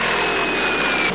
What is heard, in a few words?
bomb